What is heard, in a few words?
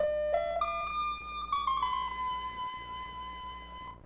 whistle; smb3